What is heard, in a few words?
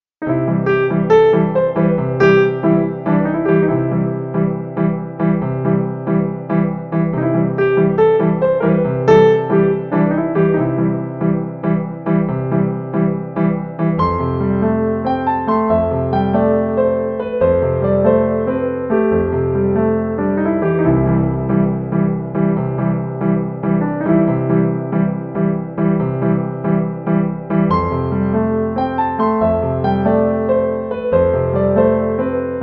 piano